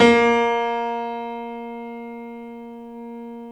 STEIN A#2, STEINWAY GRD; Greytsounds VOL-1

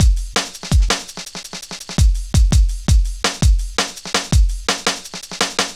Drum Loops, Jungle, 166.5

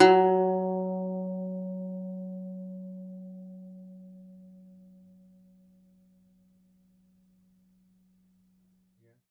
DOMRA, Segundo Domra, Plucked Strings

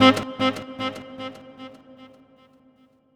Sax Echo; Keys; Horns; Drum & Bass

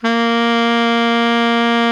BARI MF A#2, saxophones; Greytsounds - Philip Wolfe David Crozier's Saxophones; BARITONE

